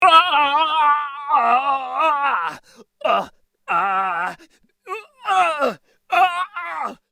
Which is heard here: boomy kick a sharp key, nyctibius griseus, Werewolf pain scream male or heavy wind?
Werewolf pain scream male